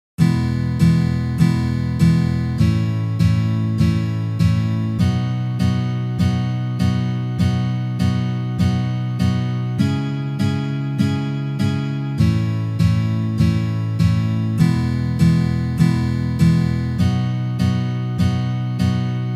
Uprazhneniya dlya igry akkorda Mi mazhor na gitare

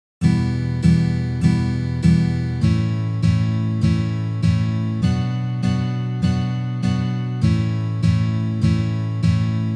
Uprazhneniya dlya igry akkorda Mi minor na gitare

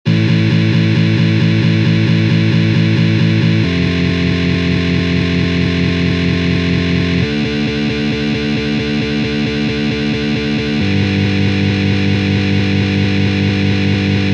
boj na gitare

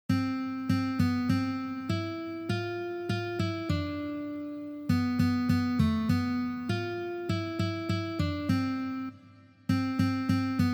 na gitare

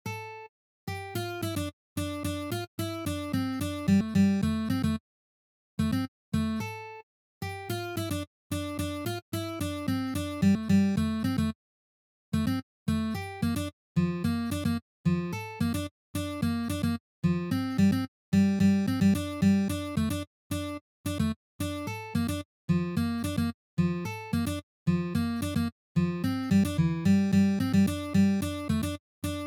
na gitare na treh strunah

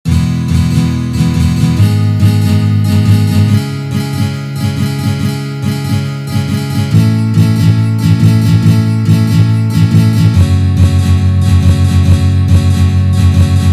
boj na gitare

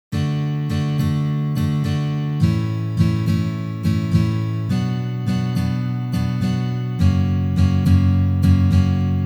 boj na gitare

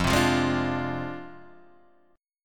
Akkord Fm6 boj

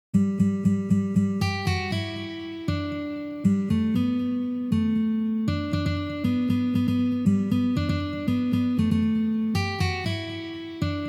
taby dlya gitary

